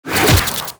Attack; Consort

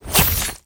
Bounty Hunter Attack

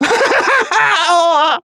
Warg Death

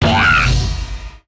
Pack Leader Death

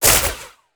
Elite Archer Attack

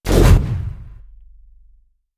Cannoneer Attack

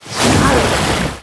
Witch Attack

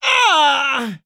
Dwarf Banker Death

